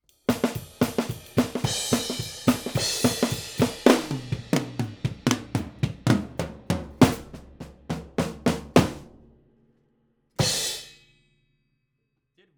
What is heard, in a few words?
OHs